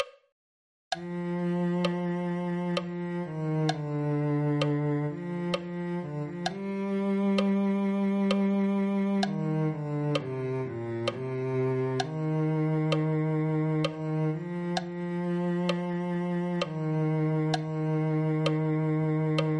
cello

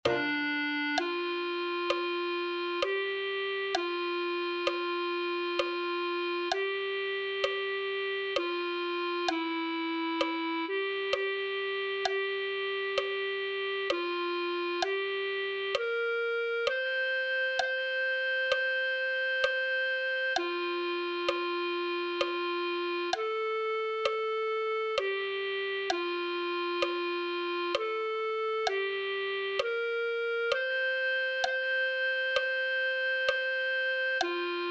clarinet

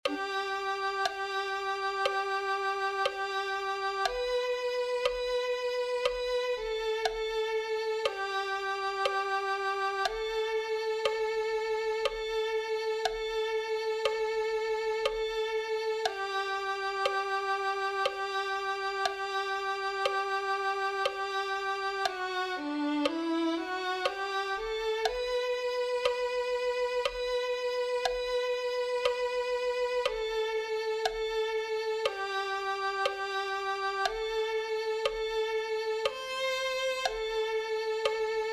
violin 2

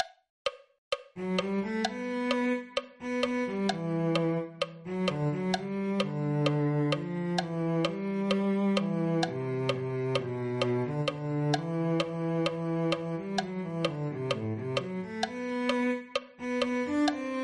cello

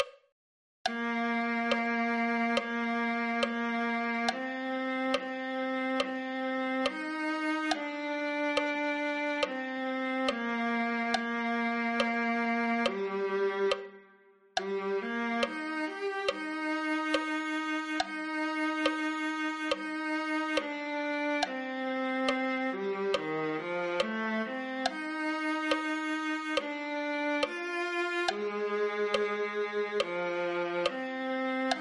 viola

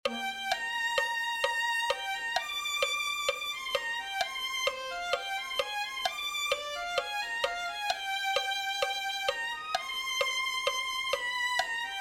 violin

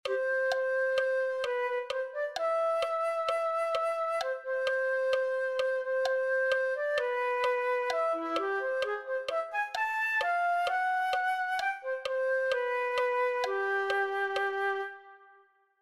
flute 1

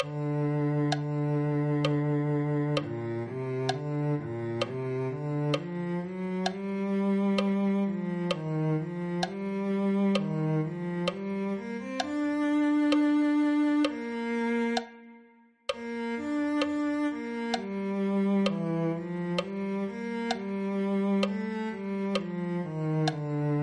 cello

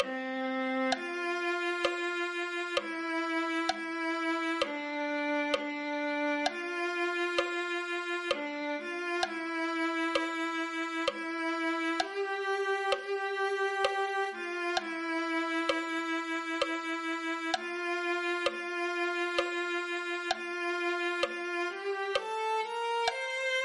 viola

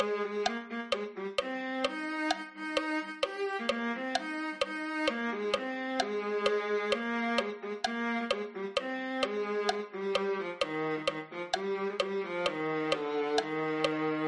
viola

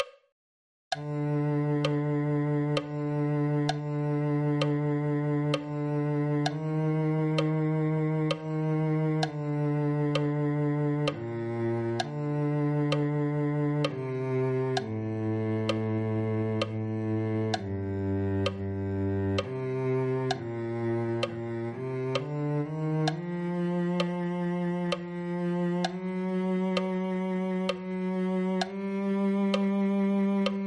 cello

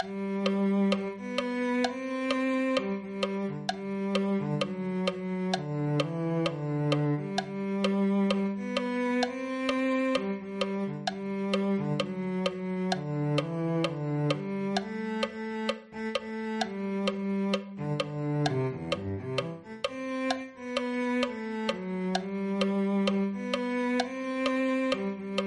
cello

